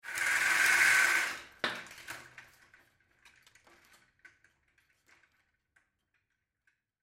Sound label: Vertical Blinds Slide Shut Room Perspective, Household Vertical Blinds Slide Shut Kitchen; Window Sound Effects; Blastwave